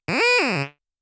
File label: creak